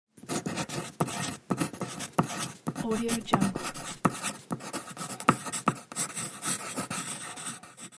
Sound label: pen writing signature